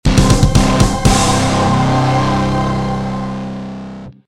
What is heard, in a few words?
Fan Fare; Kraino; Kraino Origins